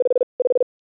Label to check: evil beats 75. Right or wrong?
Wrong